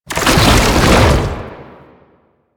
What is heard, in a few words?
Tank Infested Strafe Start, Movement; Warframe; Lasrian Tank